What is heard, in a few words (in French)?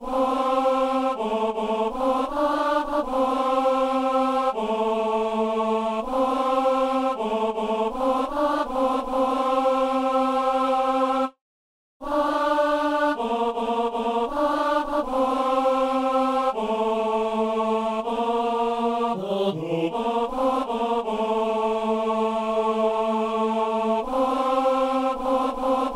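ténor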